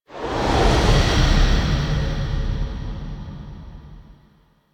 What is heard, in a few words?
Amphitheatre Colour Stinger; Warframe; Duviri